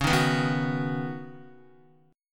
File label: Db 7th Suspended 2nd Suspended 4th, Guitar, Db7sus2sus4 chord; JGuitar